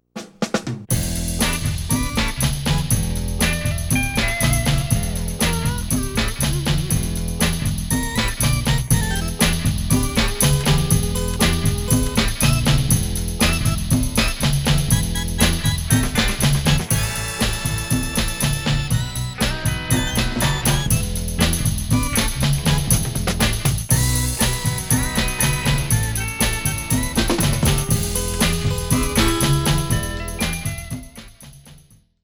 retro garage organ

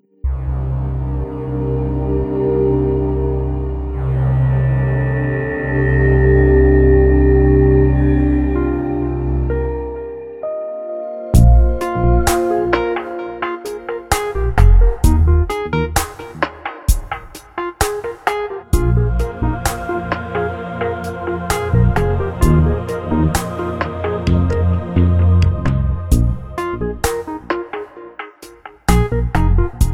electronica

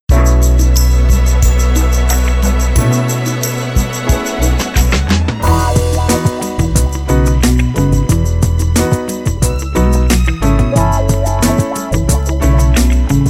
retro 70s love man

